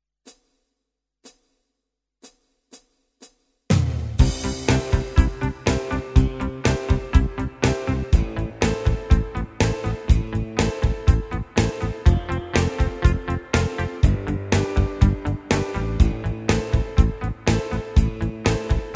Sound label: Pop Beat; styles tyros2